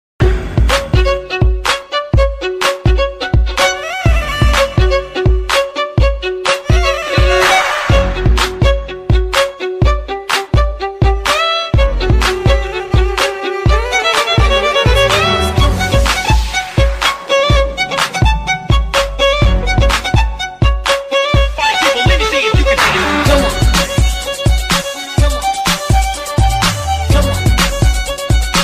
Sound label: Violin Remix